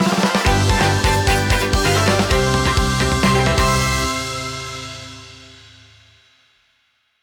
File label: Win Jingle